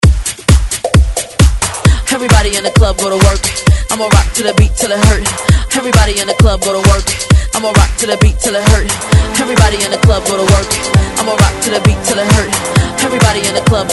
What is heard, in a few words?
Throwback Hype 132 Bpm; DJDOLLS